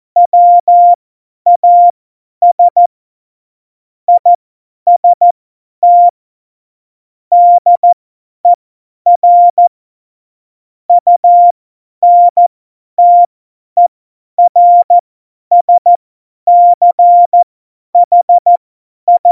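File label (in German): Witz, Tempo 70, Morsekurs, cw morsen; Witze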